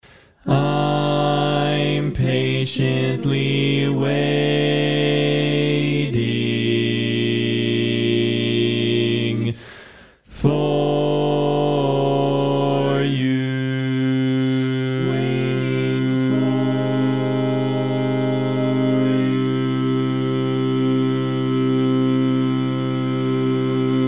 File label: bass